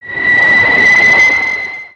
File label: Spider Boss Fire Rain Whistle; creatures mechanical